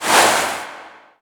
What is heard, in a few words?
Dolphin jump; RA3